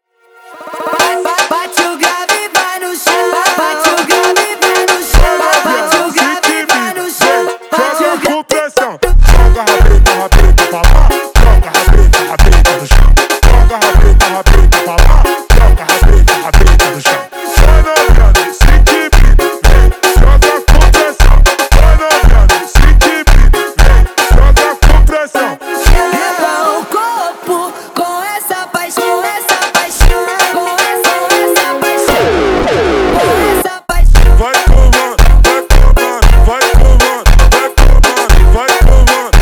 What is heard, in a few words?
Funk slowed